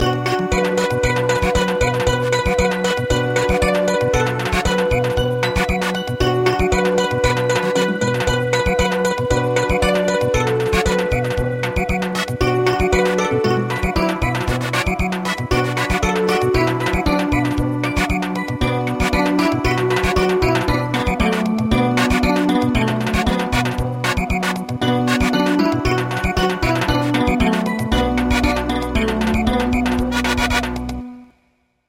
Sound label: Samba, midi